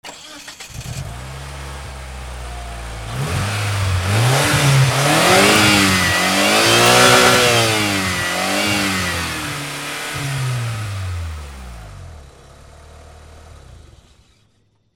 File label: CARS